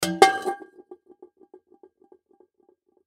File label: Pot Set Down; Household; Pot Stainless Steel 3 Quarts Set Down Wobble Cement Surface; Pots And Pans